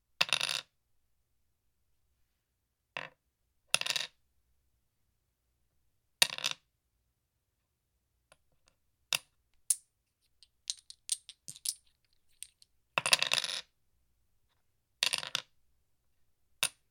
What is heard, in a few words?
Domino Single